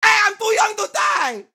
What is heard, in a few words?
town human far; Blind Drive